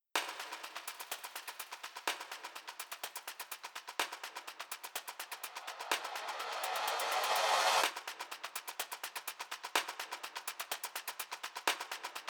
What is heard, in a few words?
The Story Continues Underscore, Percussive Drama; Narrative Mind